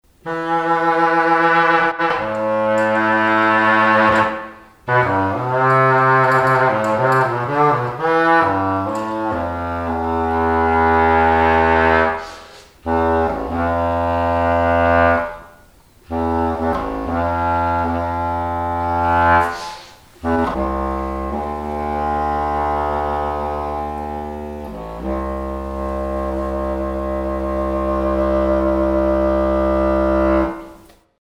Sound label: KEYBOARD MEMREED